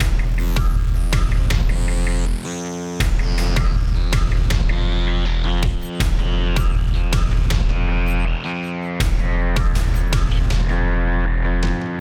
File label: Music; Sifu